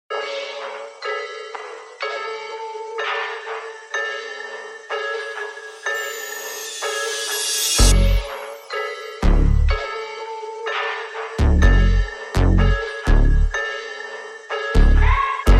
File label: Instrumental